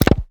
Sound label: retrofuturistic computer button; BluezoneCorp